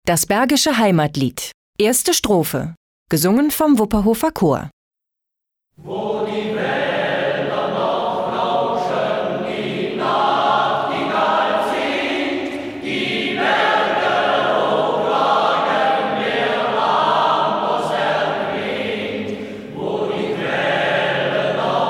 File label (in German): Chor; Wipperfuerth; Wupperhofer Chor; bergisches wanderland; bergische streifzuege; heimatweg